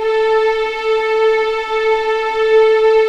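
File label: A4 legato piano, violins; East West; The Ultimate String Collection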